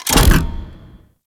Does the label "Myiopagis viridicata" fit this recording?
No